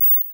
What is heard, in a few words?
ping